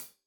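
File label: hat closed, hi-hat cymbals stereo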